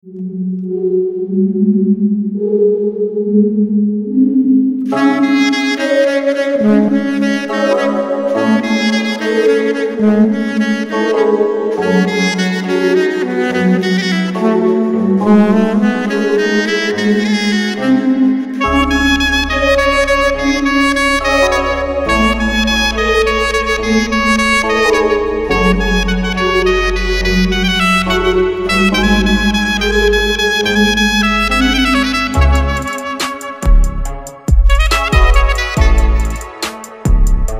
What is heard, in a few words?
SAX